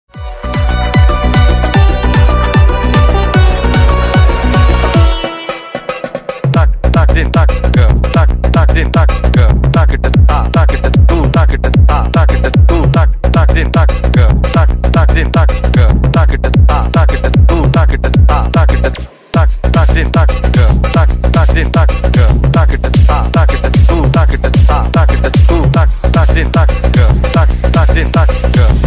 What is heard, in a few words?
english ringtone